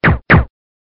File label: silencer silencer